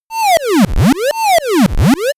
loading; comedy-cartoon; soundpool

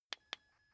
car key button click signal

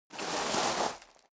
step sneaker gravel medium slide long; schritte

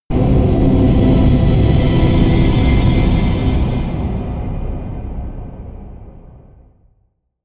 whoosh turbine swish; swooshes-swishes

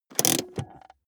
car bmw e46 interior handbrake pull up

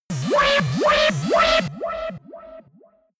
shot futuristic wobble aggressive; digital; soundpool

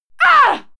female fight attack hit struggle; schreie; menschen

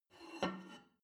plate take table slide, gastronomie; soundpool

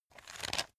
pepper mill slow; berufe; gastronomie; soundpool

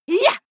female shout attack yah

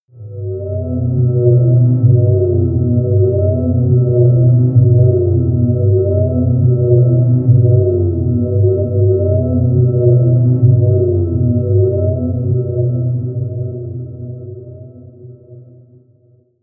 drone noise; musikelemente; drones

